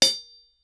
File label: hihat bell ff; Percussion; Cymbals